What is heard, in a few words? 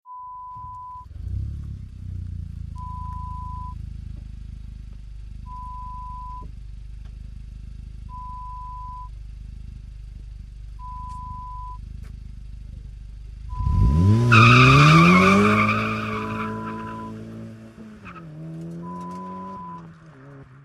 Car pull away